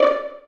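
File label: Vio pizzicato D4; Digital Sound Factory Virtuoso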